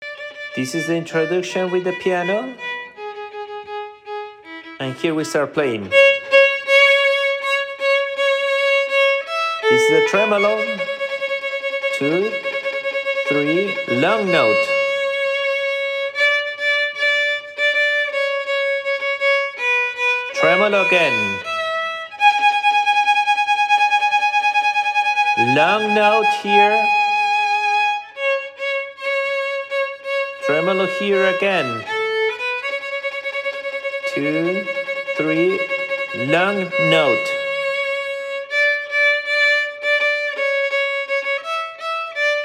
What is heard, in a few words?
Twinkle bells melody, violin